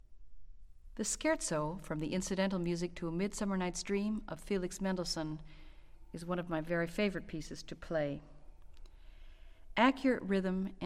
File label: Orchestral Excerpts For Flute